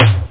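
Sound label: electric snare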